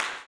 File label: CLAP, Blues Soul; Spectrasonics Vocal Planet